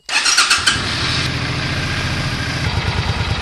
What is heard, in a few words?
suzuki c40 start, motorcycles; stereo mic